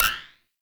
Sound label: Minigame Focus In; DE; Warframe; Grineer